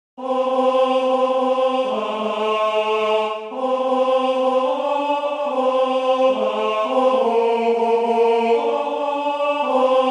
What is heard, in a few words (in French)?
voix mixtes Tenor Tempo 72; Maitia nun zira; Urunuela